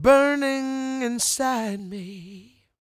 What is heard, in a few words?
croon, Vocal Planet Blues Soul; Spectrasonics